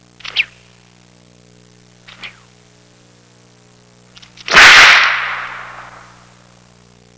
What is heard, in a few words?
whip; Sound Effects for Multimedia - Volume 1